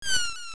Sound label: Seagull; Seagulls at the beach